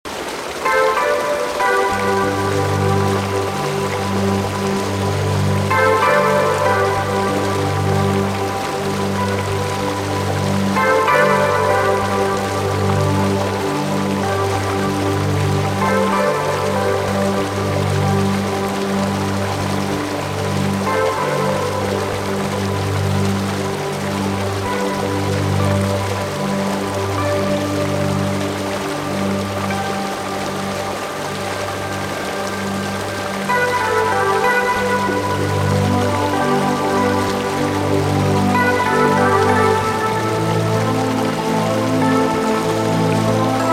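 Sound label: nature sounds, water noise with music background; звуки природы шум воды и музыка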